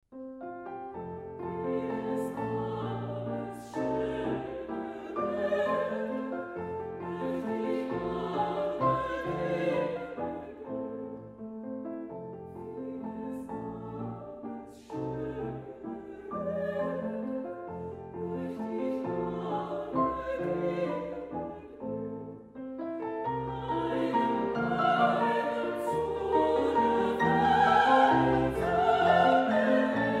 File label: Waltz; brahms; liebeslieder